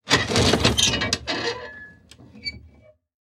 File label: Industry Gate Metal Gate Drag Squeak